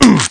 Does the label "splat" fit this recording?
Yes